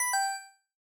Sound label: PINPON